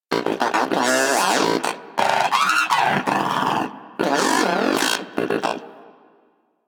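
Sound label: Moa Aggressive Vocal Interact Stand Forward; Warframe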